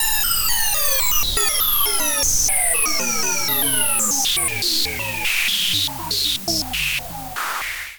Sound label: Phaser Loop